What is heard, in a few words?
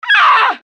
Pain female